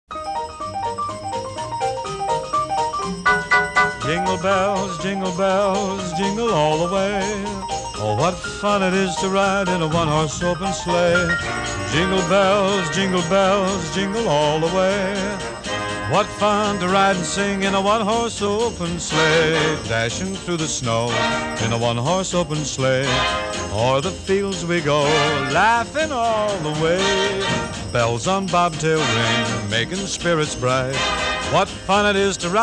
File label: jingle bells